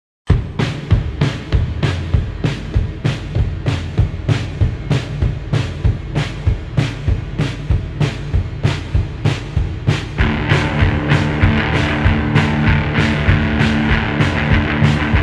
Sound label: slowed n reverb